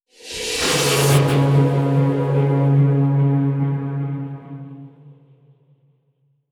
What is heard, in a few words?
horror stinger; Marek Klemczak; Horror Stingers From Hell